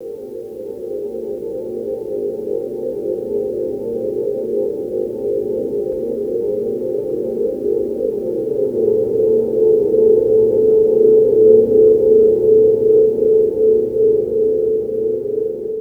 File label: ambience